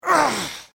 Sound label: Male Grunt Human Injury Pain Vocal Ugh Ouch